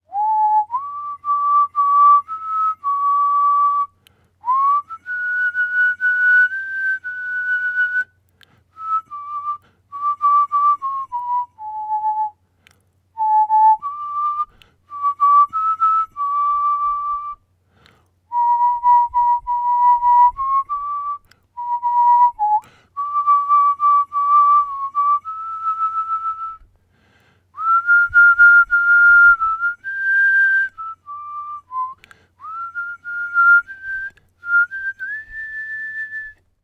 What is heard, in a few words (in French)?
en sifflant